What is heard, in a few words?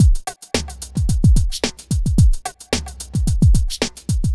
r n b kit 110